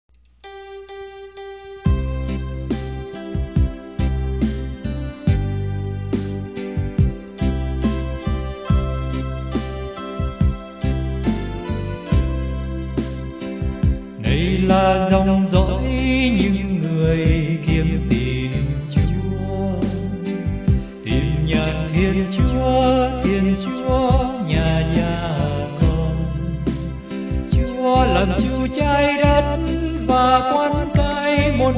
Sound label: BASS